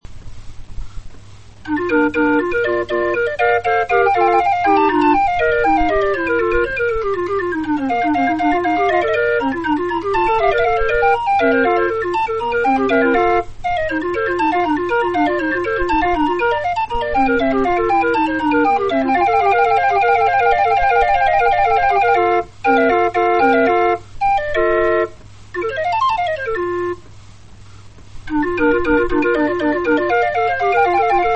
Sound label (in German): floetenuhr